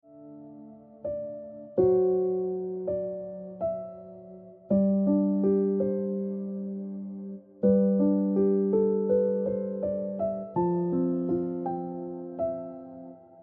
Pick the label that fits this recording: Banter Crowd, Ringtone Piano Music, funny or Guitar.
Ringtone Piano Music